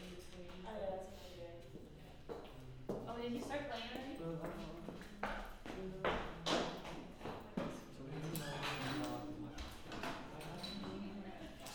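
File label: Choir Harmony Low Mid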